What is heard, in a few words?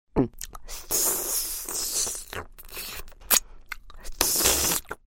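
sounds of swallowing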